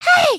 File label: Wave Fast; emotes